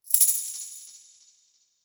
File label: percussion one shot; Slate; SD PASADENA Pop Sample Pack